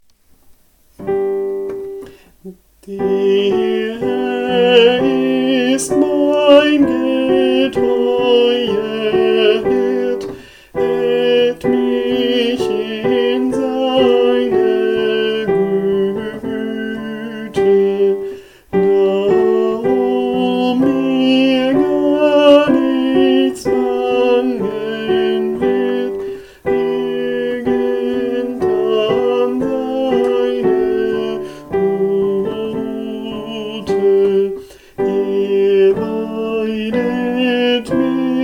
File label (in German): Sopran, Kirchgemeinde; Bach